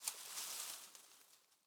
leaf rustle crunchy med

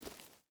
footstep burlap dirt walk; Rust

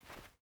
footstep burlap cloth walk; Rust

